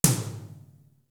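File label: dance tom low; East West; Global Sounds; JD DANCE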